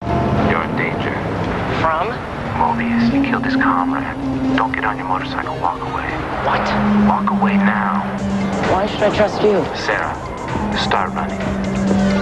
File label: warning; witchblade